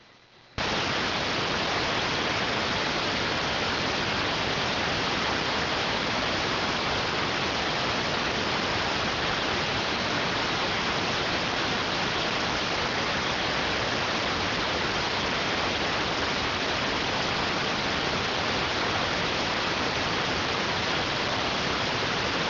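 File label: radioteletype receive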